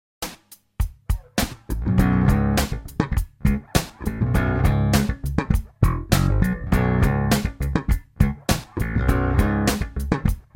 Slap with root fifth doublestops

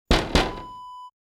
window knock

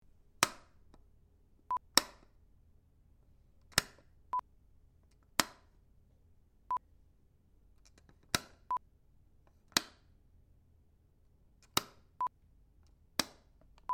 Light Swith On and Off

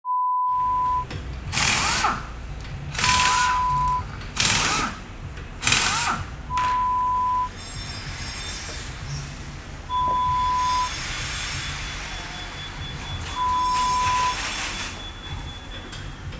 Garage Ambience sound

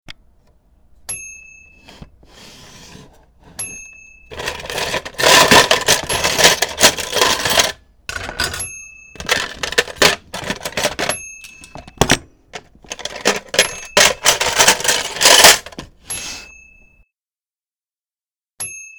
Kitchen Drawer With Utensils

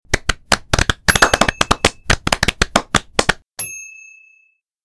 Golf Clap

